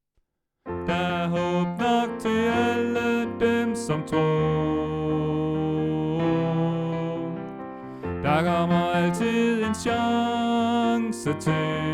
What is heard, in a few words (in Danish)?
Kom og se far danser Bas; oevefiler